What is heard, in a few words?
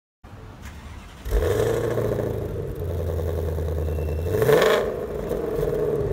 Ford Mustang Shelby GT500 Exhaust Sound Normal Mode